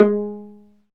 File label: STR VLA PI, Chamber Pizz, String Master Series; Roland - String Master Series